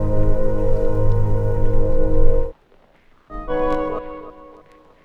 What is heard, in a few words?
Orch Sounds; Chillin Out; Sonic Foundry (Sony Creative Software); Stylus Pressure Urban Grooves on Digital Wax